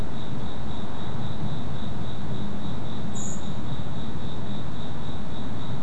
Turdidae sp good prob Eyebrowed Thrush, nocturnal flight call; Beijing